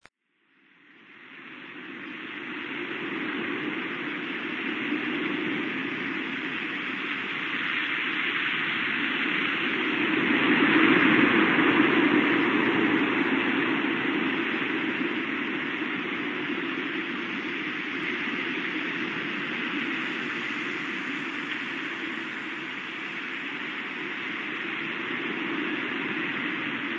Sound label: Wind